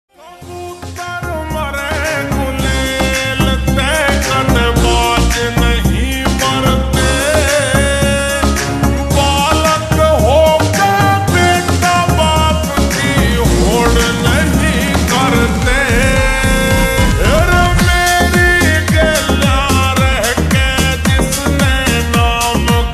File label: Slowed Reverb Ringtone